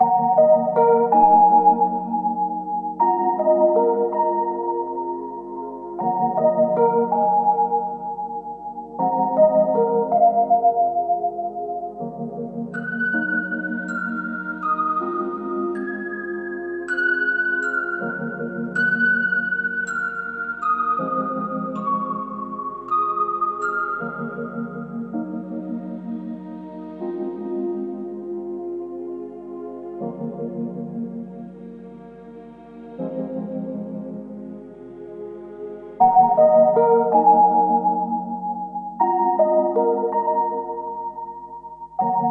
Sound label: 160 melodic loop D#min; acqua